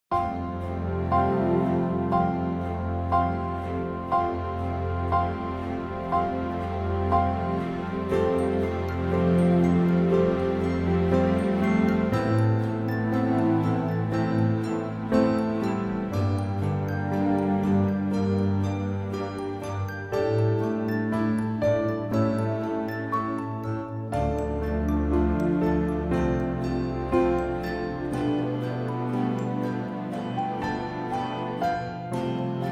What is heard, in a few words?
F orchestral; key - F - vocal range - D to Bb (huge vocal range)